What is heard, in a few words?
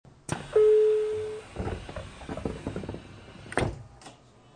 door